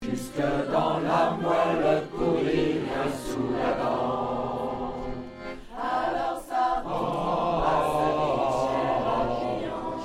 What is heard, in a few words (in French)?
basse